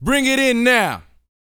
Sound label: VOX F, Vocal Planet R&B & Dance HIP-HOP; ILIO